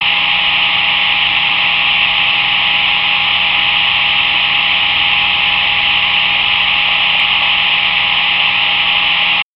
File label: art preamp noise amplified